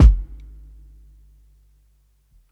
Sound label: PICCOLO KICK, drums; BASEMENT DRUMS VOL 2